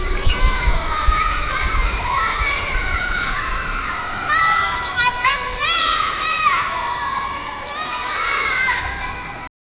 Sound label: childrens playing far awa